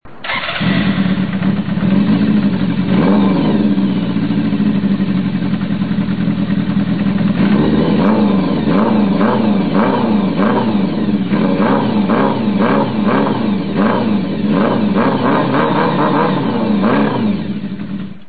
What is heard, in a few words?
mufflerless, bike exhaust; zephyr